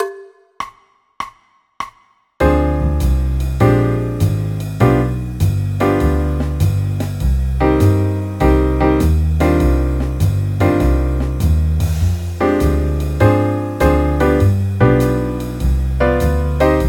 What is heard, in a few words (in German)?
c moll akkordverbindungen